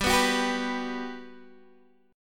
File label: G Major 7th, G, down, Guitar, DADGAD